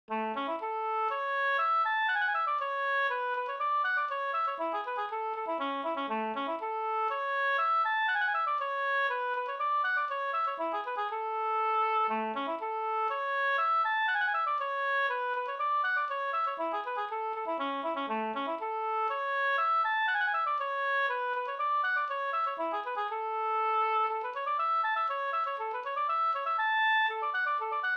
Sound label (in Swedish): polska Bingsjopolska; e Nylandspojkarna Nerifran; "Nerifrån", Bingsjöpolska efter Nylandspojkarna